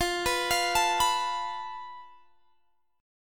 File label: F Major Flat 5th Guitar Standard; arpeggiated; JGuitar